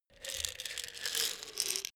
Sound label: pillbox turn